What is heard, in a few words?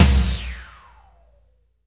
TOM FUNK; Roland LA; DRM Toms